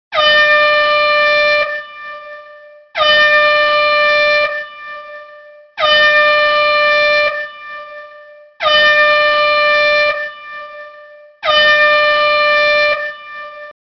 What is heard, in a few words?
Airhorn; Retro Ringtones